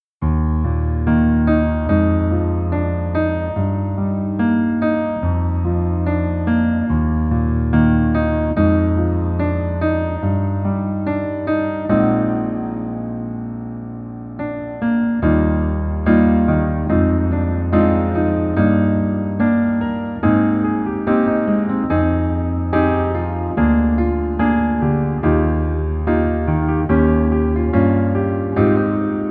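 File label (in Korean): Piano version 멜로디MR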